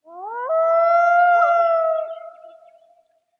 Rand Bird; moul

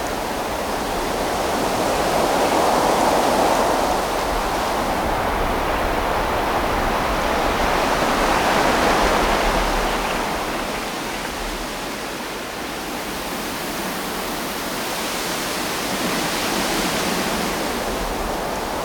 Amb Wind Loop; moul

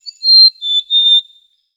Bird Call

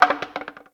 Bone Stn Hit

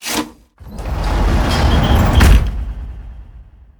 Trap Gate

